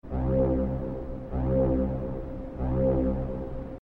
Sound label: Core Drill Sound